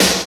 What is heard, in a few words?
snare trash; Roland L-CDX-01; Snares 5; Sn Modules 5